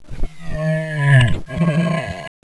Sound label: hc die; aomclassic; monsters; headcrab